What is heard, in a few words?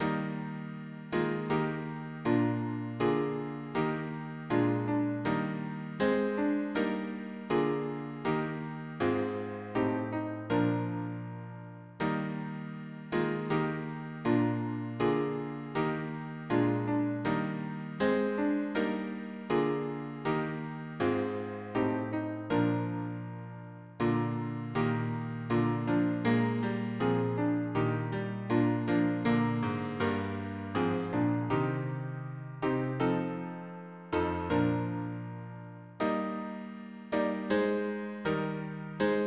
harmony piano